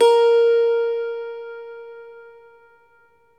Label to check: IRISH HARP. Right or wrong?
Right